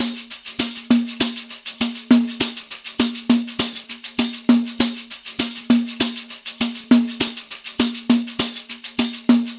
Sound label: PERCLP, ArabianTraditions